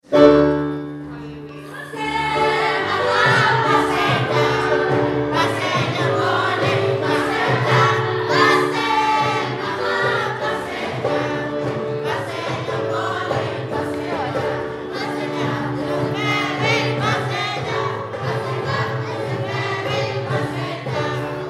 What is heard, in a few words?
school choir at the leaver s assembly